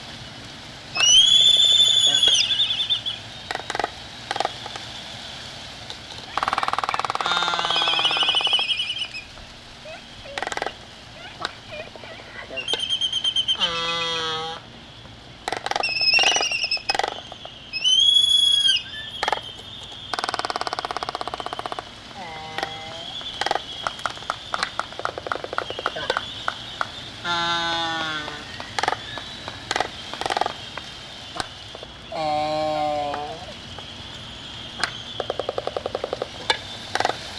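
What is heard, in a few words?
albatross, dark-backed; albatros temnospinnyi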